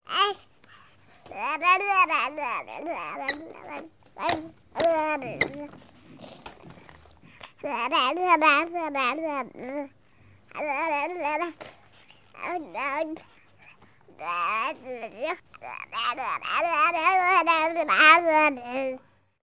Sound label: human baby early vocalisations